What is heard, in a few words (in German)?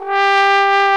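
POSAUNE VIBRATO; Best Service; Alpine Volksmusik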